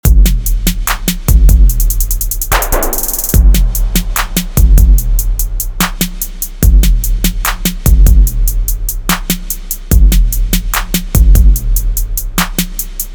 BEAT

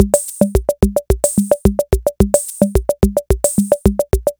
A Beguine

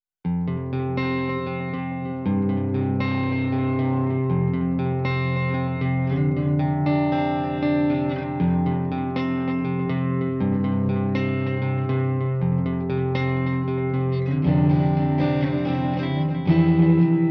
Delay; Mariner

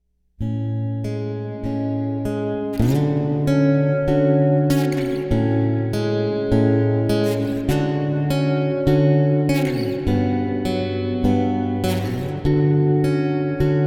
AcoustiVerb PLATE

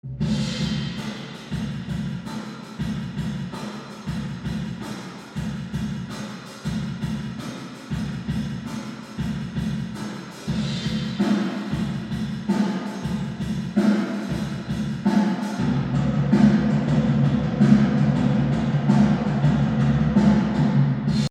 Section A Reverb

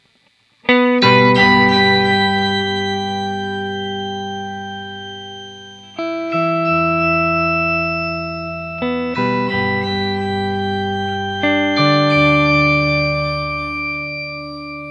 octave delay

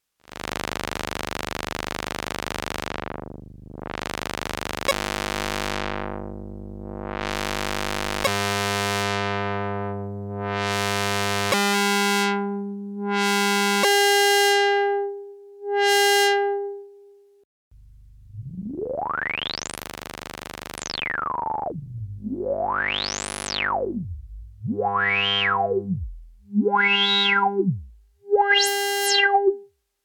hipass

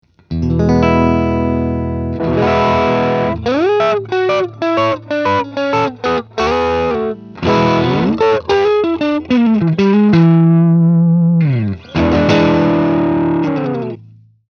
MID GAIN 808

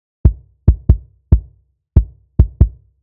Kick Arrangement

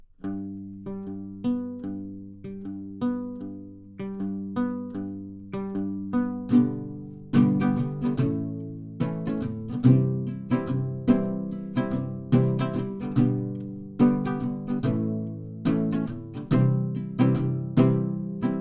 Narrow bridge

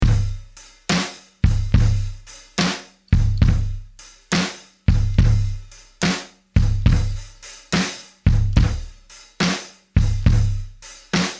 drums

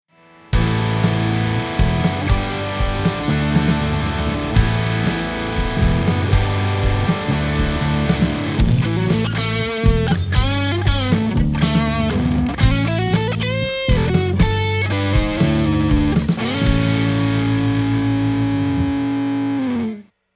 ROCK; SUHR

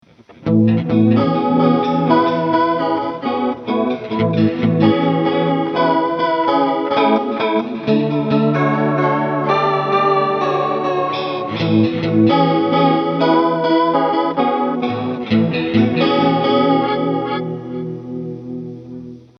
Chord 2 Wet

